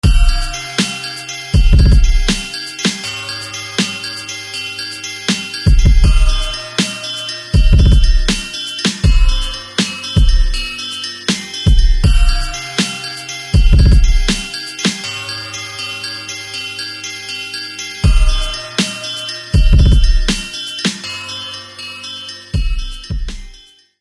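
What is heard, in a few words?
Street Bells; SFB FX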